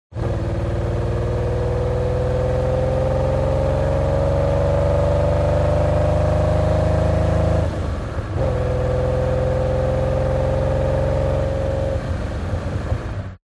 TRANSPORTATION CAR Lamborghini Countach Accelerate; smartsound